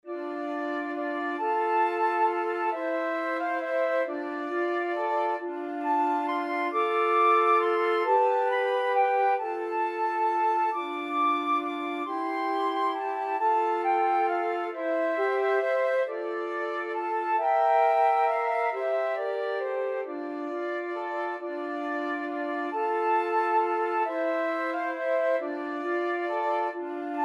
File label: scarborough fair, flute trio